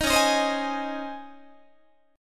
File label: Db Major 7th Suspended 2nd Db Guitar; 18,21,18,22,19,19; 4A,4E,5A,5D,5G#,6C#; strummed; chord shape